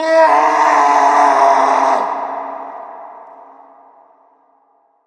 scary sound

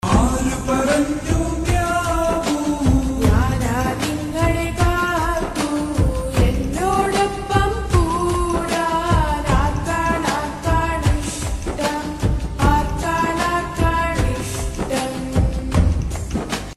slowed

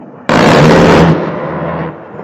brrrrt, warthog

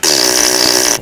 insane fart

